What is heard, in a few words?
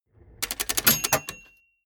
mechanics small rattle bell